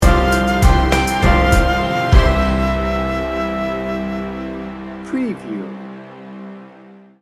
ballad bed sting bumper

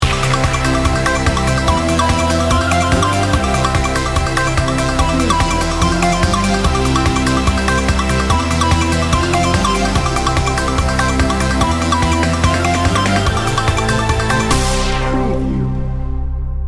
science and technology

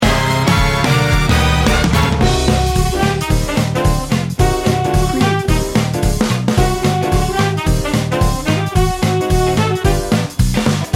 jitterbug jive

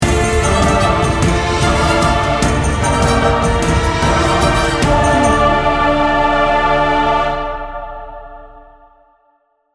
dark forces sting bumper

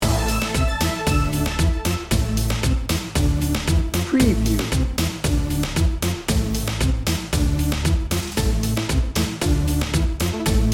reggaeton heat

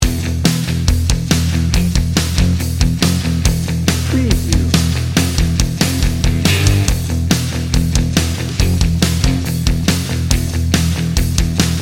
macho rock loop

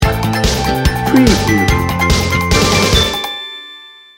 cardio crunch sting bumper

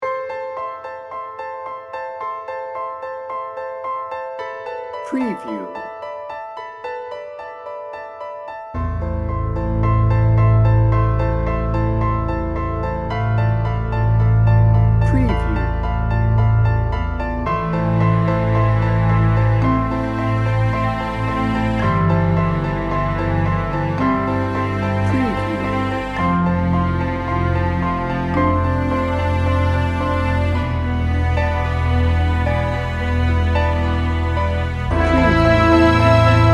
cinematic heartwarming dramatic

